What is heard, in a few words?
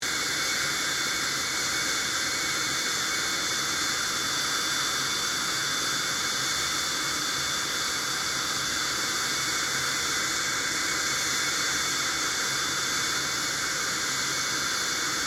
BAE Hawk 127 idle taxi; Airplanes; Vehicles